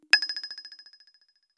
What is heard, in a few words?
droplet notification